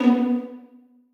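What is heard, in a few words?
2nd violins pizzicato a#3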